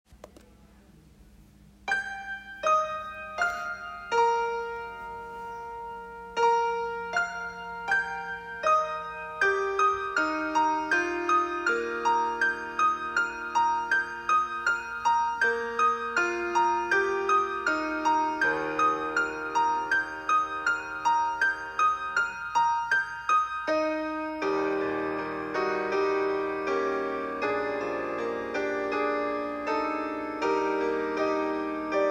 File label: piano; I heard the bells holly